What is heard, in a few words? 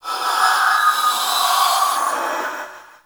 Minion Vocal; Warframe; Stalker Acolyte Minion